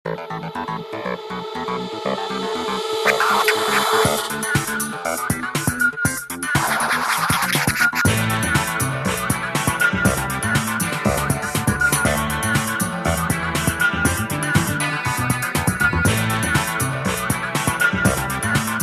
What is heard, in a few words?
iphone ringtone